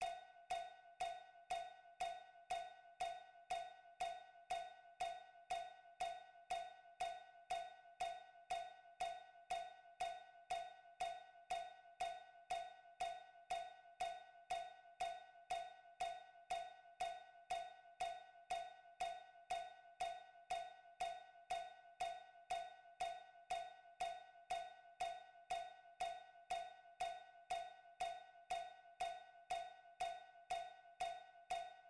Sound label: metronome